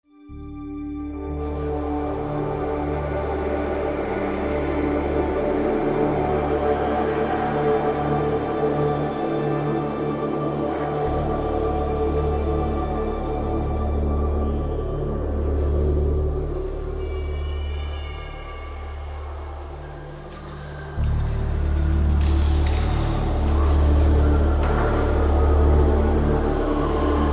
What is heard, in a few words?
city music; painkiller battle out of hell